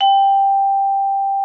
VIBES G5; Xsample VOL-4

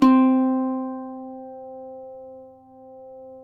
CUATRO; Spectrasonics; Hans Zimmer Guitars VOL-2

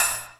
GATED HAT MD, MPC-60 DRUMS; Greytsounds VOL-1

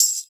Shake Tamb; Percussion

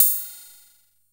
KR55 CYMBAL; INIS - Funky Xtreams; KR55 KIT

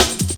Drum Loops, 158.5; Jungle

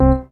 DM ORG1, Dance Mega Synth

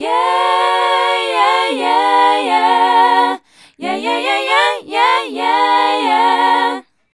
Female Group, Vocal

